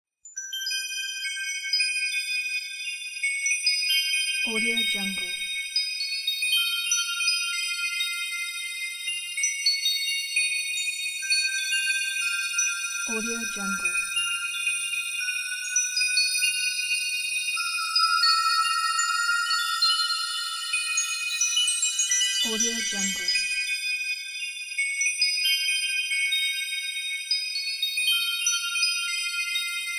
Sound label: spooky space bells